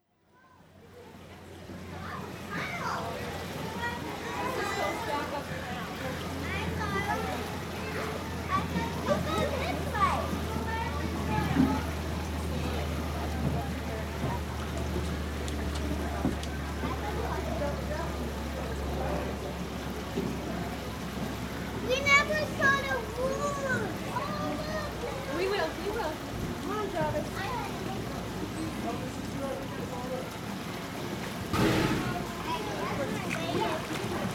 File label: children zoo with whale